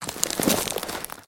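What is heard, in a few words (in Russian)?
шелест открытой пачкой чипсов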